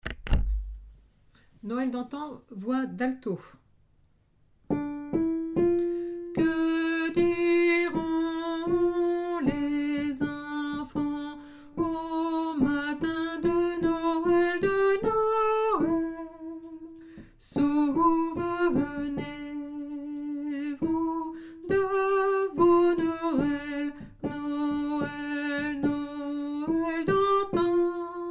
noel alto; noel dantan

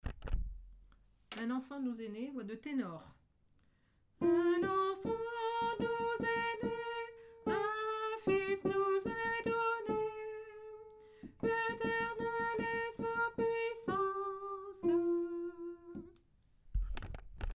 tenor; un enfant nous est ne

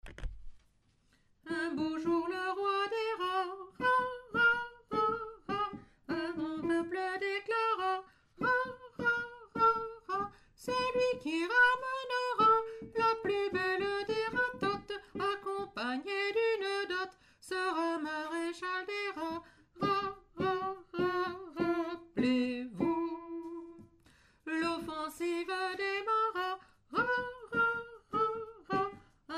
tango du rat soprano; choeur

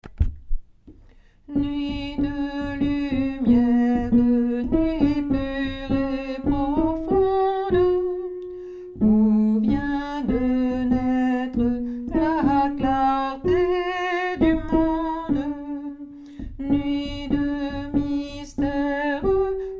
alto, chants noel; nuit de lumiere; nouveaux noels

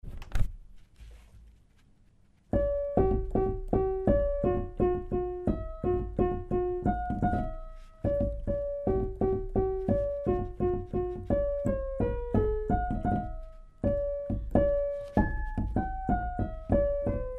chanson villageoise piano; chorale

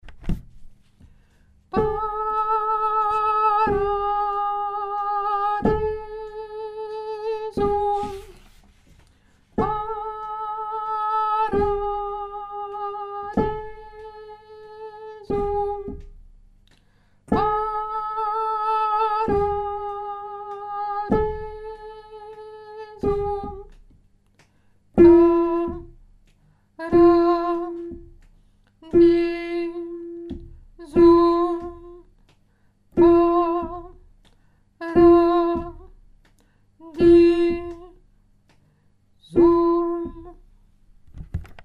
lettre G tenor; chorale

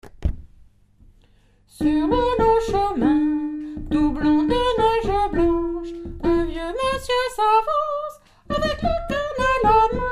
vive le vent soprano; nouveaux noels; chants noel

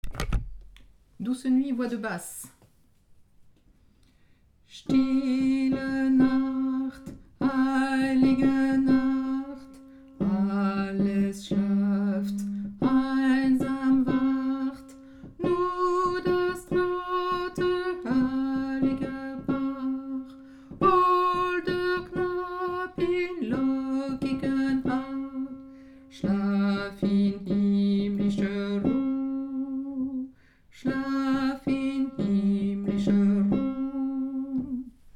basse; stille nacht

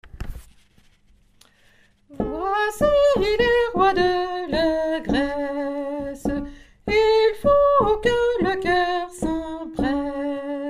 basse, chorale

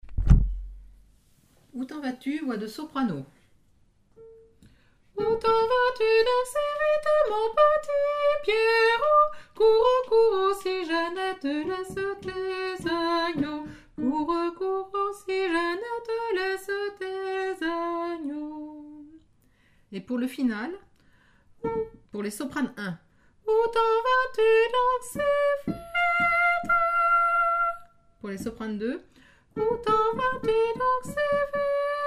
soprano; ou ten vas tu

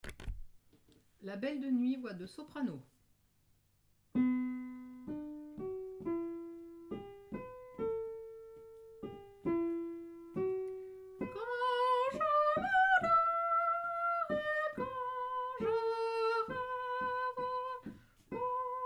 la belle de nuit soprano

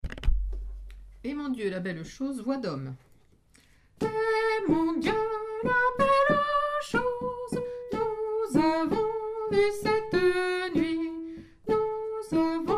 hommes; eh mon dieu la belle chose

